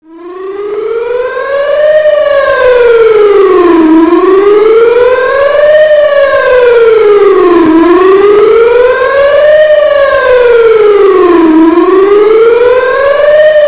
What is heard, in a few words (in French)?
Sirene d'alerte aux population